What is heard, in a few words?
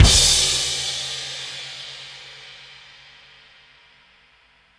BD Crash